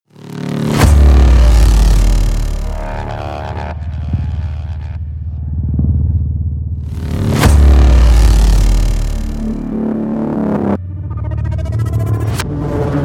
warp tunnel

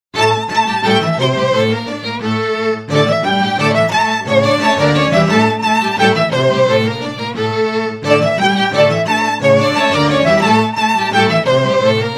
string quartet

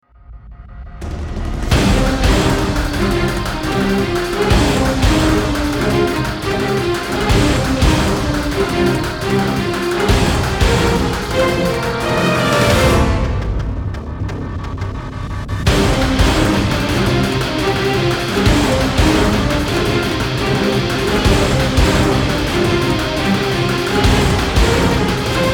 remix no choir

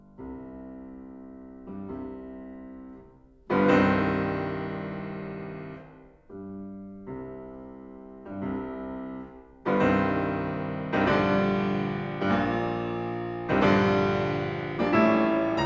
piano sonata no 1 in d moll; sergei rahmaninov; op 28; movement 1 tempo i

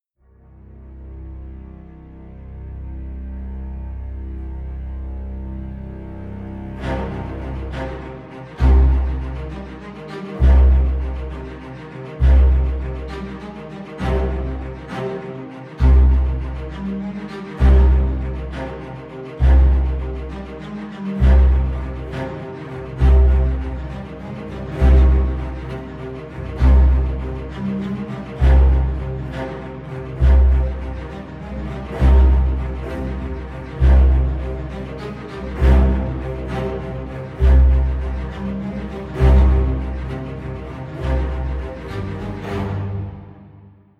low strings stem

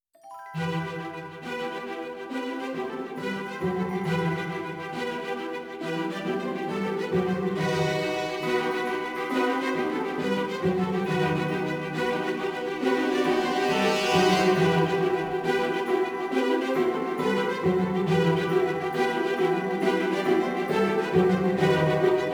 veritas non choir

